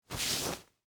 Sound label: Short Sleeve Wipe, Clothes, Shirt, Hand; Foley; Dressing Sound Effects